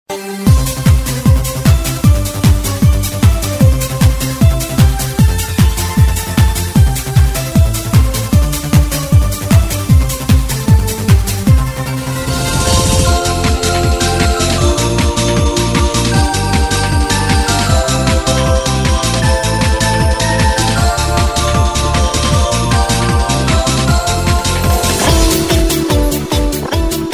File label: christmas party